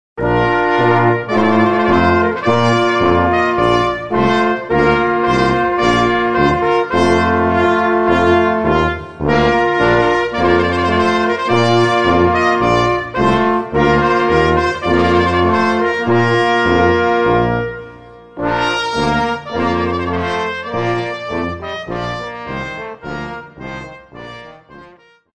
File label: Trumpet voluntary; J. Clarke